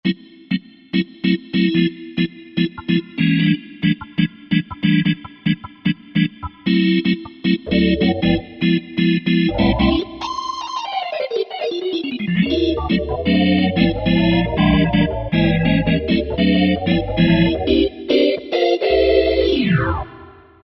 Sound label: Rotor Organ, Yamaha PSR-3000; Factory Voices